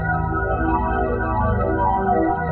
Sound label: thick seventh pad loop; Pads